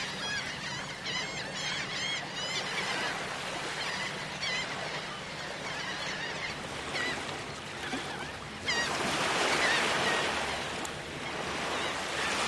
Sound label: Shorebirds Talking On Beach